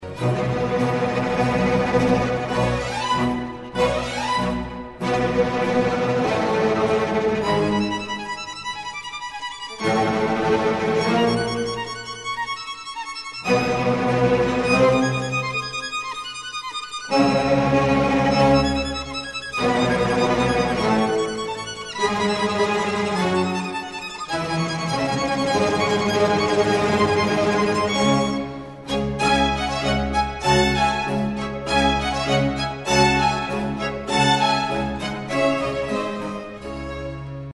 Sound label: vivaldi concerto violin string; op8 no1 mvt1